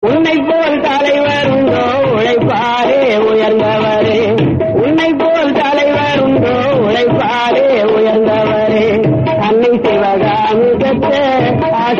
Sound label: Song Tamil Song; Kamarajar; Nadar